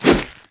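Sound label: wolfman basic attack; LoL; warwick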